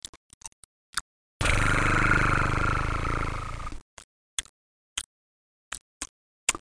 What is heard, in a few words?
lick; Spelling Made Easy